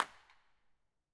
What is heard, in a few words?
incinerator dirac